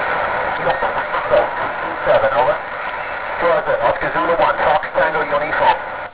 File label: ms; VHF; OZ1CTU